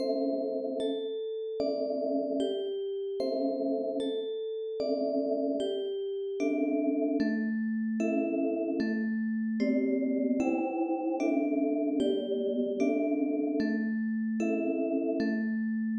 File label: menu